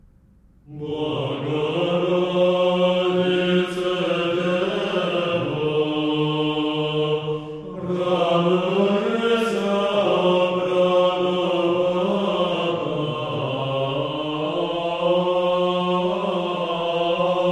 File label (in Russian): Богородице Дево мужской хор